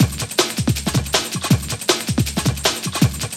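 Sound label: drum loop; X-Static Goldmine 3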